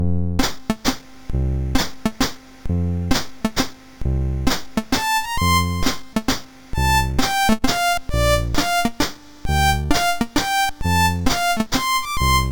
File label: Waltz, MOS 6581 R2